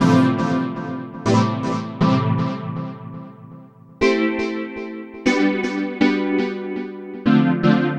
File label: Deep House Chord; Sonic Foundry (Sony Creative Software); Afterhours EDM; Retro Active